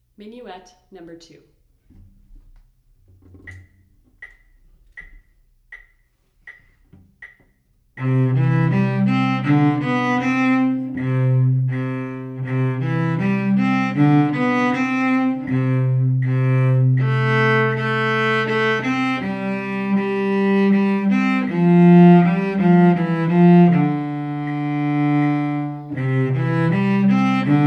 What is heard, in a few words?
Minuet, Cello